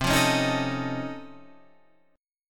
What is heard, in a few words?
Db Augmented Major 9th, C